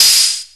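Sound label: electronic cymbal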